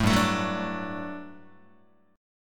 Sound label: G Major 7th Suspended 2nd G down Guitar; GM7sus2 strummed